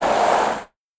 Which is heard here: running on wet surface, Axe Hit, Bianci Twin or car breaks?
car breaks